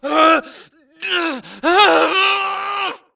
grunt Struggle; Titanfall 2

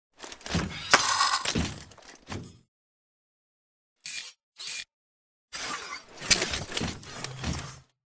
Victory Gunkick; Coliseum; Titanfall 2

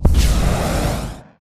atlas dash close; Titanfall 2

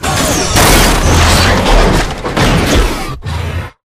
atlas melee pilot extract throw; Titanfall 2

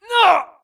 grunt death quick; Titanfall 2

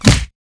bullet light ballistic flesh; Titanfall 2

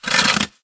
Stalker Damaged Footscrape Long Concrete; Titanfall 2

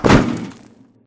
Small Concrete Wall Stop; Boomtown; Titanfall 2

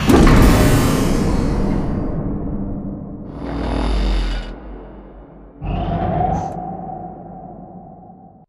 Giant Floor Panel Assemble Start Mech; Boomtown; Sideways Town; Titanfall 2

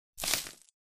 imp bullet lightballistic dirt debris; Titanfall 2

